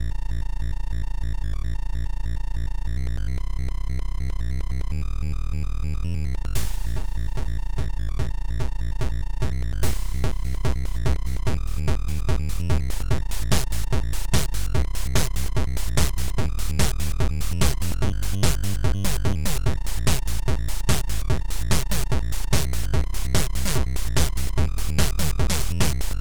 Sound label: BEAT